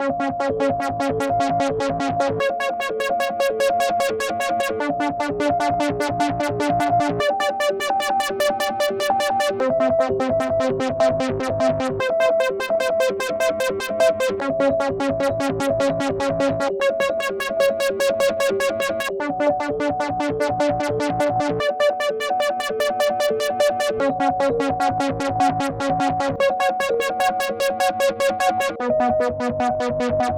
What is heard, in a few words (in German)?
musik; portal 2; altes aperture